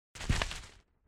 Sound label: Rolling